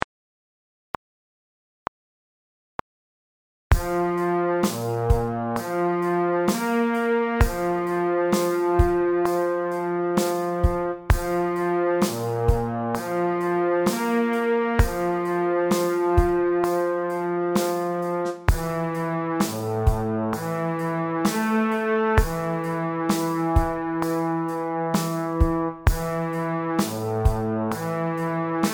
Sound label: Warm Up Brass with Drumset; Coopersville